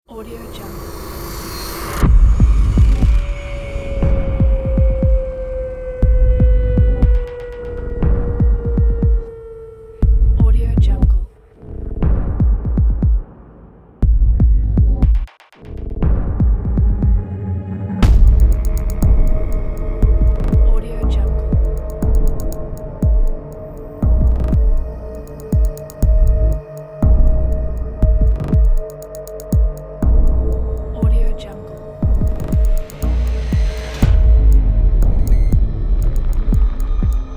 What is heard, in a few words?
abstract scary trailer